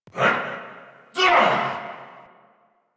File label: Grunt; en; Warframe; Nightwave; Season Three Glassmaker; Nihil Fight